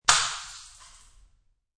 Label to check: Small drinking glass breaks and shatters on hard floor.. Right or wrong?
Right